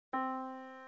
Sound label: Yamaha Disk, piano sound